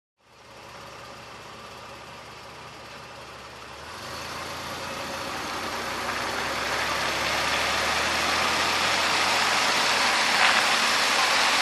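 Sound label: car driving on wet road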